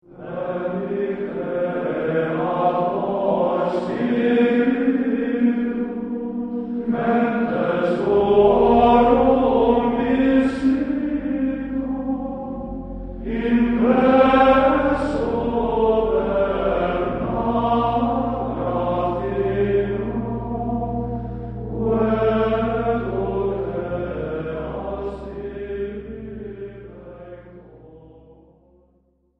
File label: chant